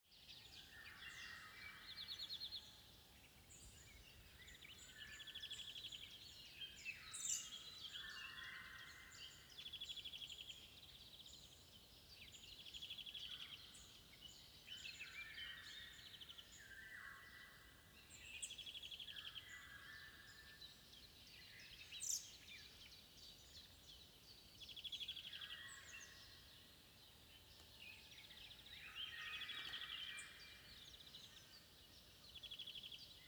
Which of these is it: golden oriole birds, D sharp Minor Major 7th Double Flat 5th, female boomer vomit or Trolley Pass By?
golden oriole birds